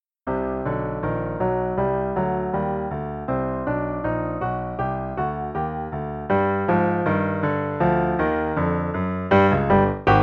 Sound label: no click, both parts, qn=160; Level 1 Performance - page 18; The Crawling Spider